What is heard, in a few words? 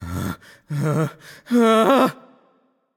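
nonverbal fear; voicepack; smite; merlin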